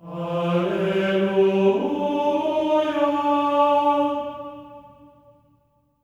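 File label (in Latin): alleluia G4; Spectrasonics; Symphony of Voices; GR ALLELU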